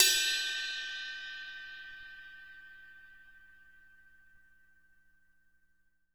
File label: CRASH BELL